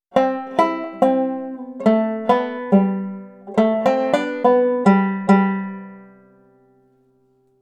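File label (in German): lick mit nail gliding, banjo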